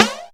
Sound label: Flange Snares